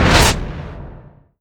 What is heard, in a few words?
sunray beam stop; weapons; dota; phoenix